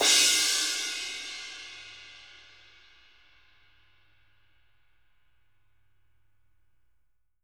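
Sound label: dark crash, Living Drums, JZ CRASHES; Sampleheads; Peter Erskine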